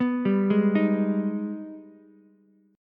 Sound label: G sharp Minor Major 7th G sharp down Ukulele; arpeggiated; JGuitar